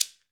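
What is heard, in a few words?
GHANA shaker, African Perc; E-MU Formula 4000 Series Vol. 4 – Earth Tones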